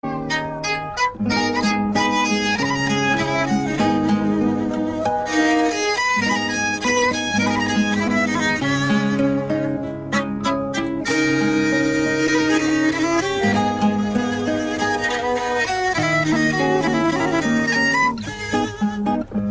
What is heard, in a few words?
kemence